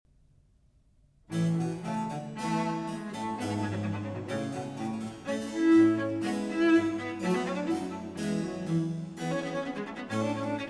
Sonata For Cello and Continuo in B flat; Vivaldi; RV.47

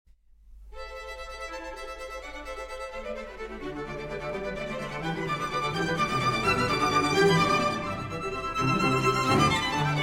String Sextet No. 2 in G Major; Op. 36; Brahms

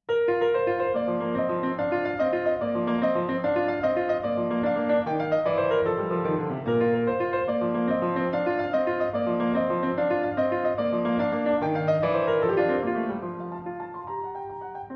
Harpsichord Sonata; B-Flat Major, TG 14; Galuppi

